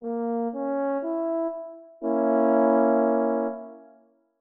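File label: triad diminished Bb3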